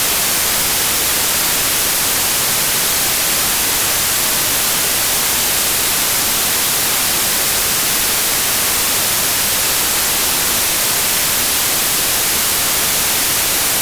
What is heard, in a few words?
White